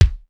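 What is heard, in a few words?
house kick; sr16 bd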